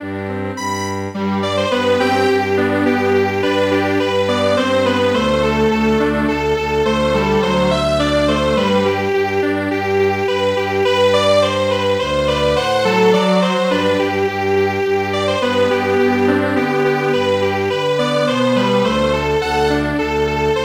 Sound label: Strings Quartet